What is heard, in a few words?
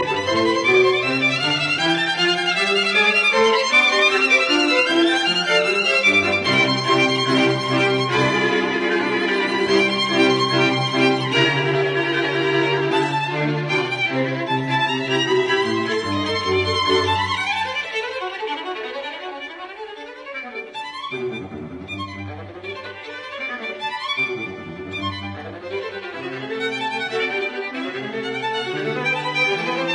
String Quartet in C major, Allegro molto; Beethoven; Op 59 No 3; Rasumovsky